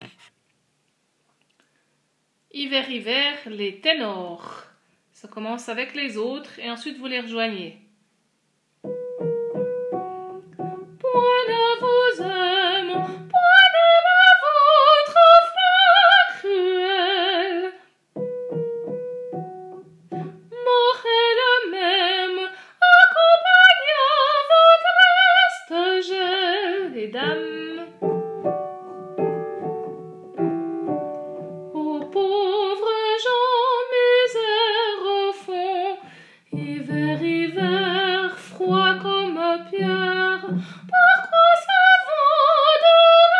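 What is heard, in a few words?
Hiver Hiver ténor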